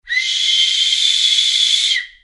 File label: death whistle